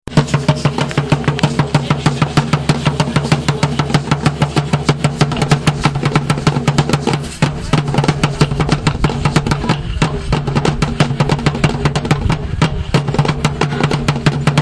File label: drums; aztek